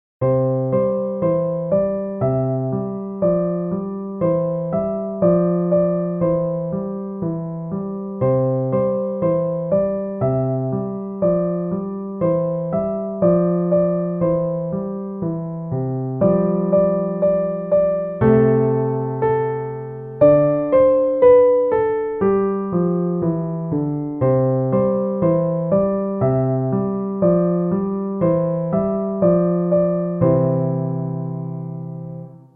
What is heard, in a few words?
piano melody; au clair de la lune